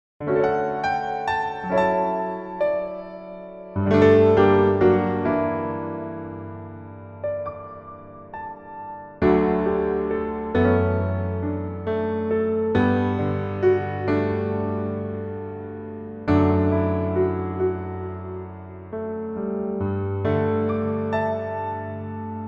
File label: Piano MR, 멜로디MR